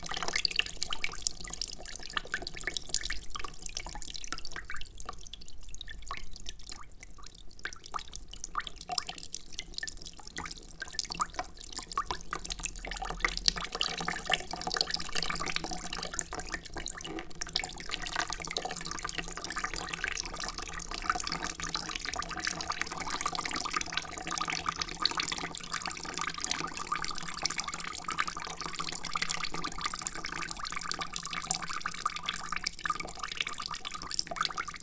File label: Dripper, water dribble